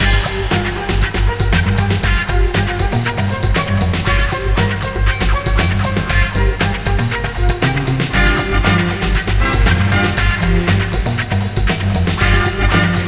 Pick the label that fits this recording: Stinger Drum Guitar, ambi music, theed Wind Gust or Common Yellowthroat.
ambi music